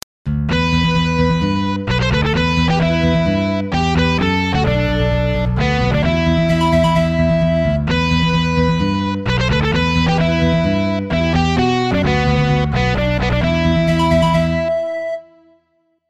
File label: iphone ringtone